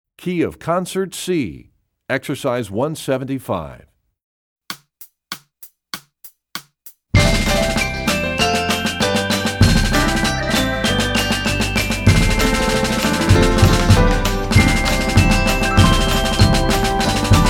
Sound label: Percussion; Rubank Studies Concert C; EE2000 Book2